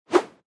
Motion Swish

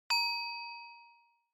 Onoma-Ding (Low-Short)

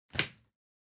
Vacuum Cleaner Pipe Attach

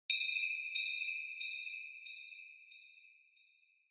Single Accent Single Delay

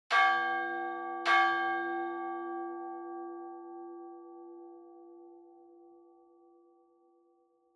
Church Bell Mid

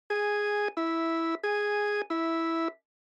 Ambulance Siren Fake Low

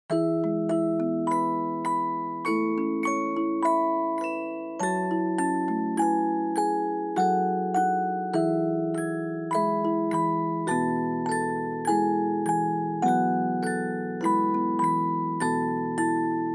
Twinkle Twinkle Little Star, Dry, Fast

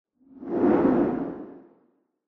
Camera Motion, Mid Low Reverb; シーン・カメラモーション; 中低 リバーブ

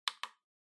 Portable CD Button

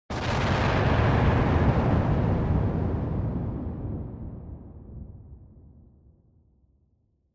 Explosion Far

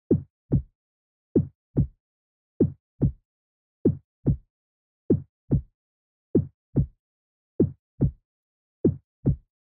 Heartbeat Slow Loop

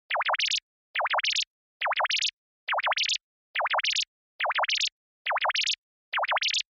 Panic Low; Onoma

